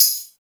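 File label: percussion, Drum & Bass, 160 BPM; Ueberschall; Drum & Bass Flash Trackz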